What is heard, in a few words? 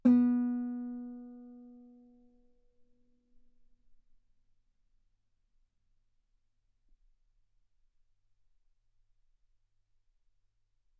Guitar ff B3; sul B